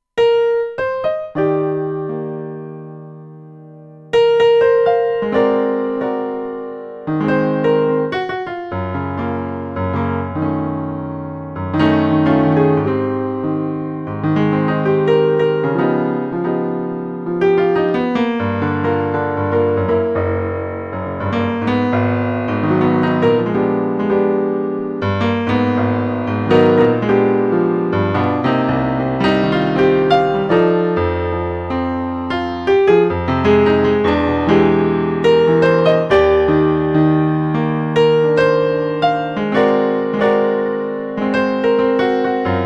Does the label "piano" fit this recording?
Yes